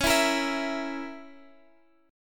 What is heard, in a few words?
Bb Diminished Db